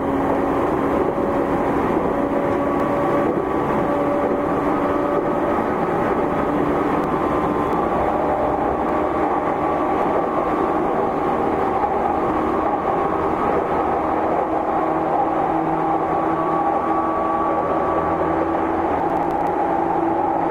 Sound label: E231; tetsuon